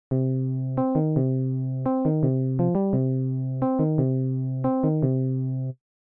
Subtractive Example

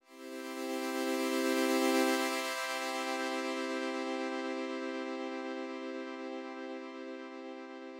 Pad With Effects

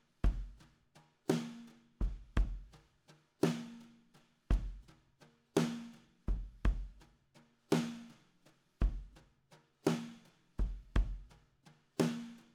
Control Drums

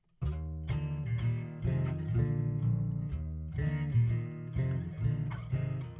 acoustic guitar 6 semitones down

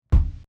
Kick With Delay

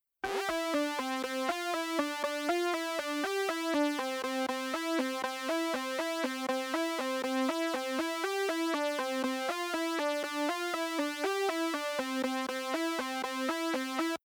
Synth Before; SuperVHS

